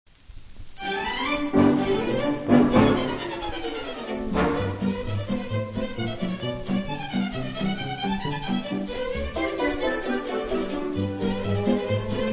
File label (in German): Virtuosen Polka